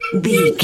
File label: whistle slide wobble notes short